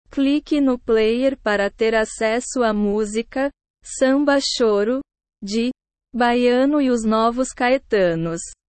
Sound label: samba choro